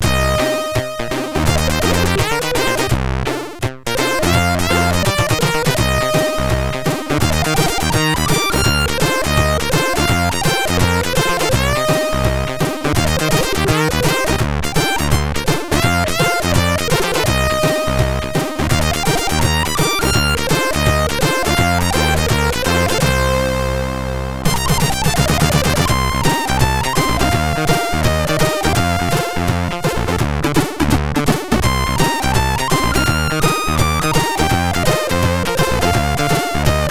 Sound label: Short Jazz, MOS6581R3